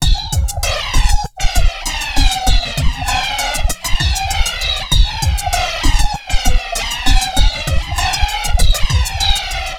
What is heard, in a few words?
Trap Loop; Sonic Foundry (Sony Creative Software); Esoterik Beatz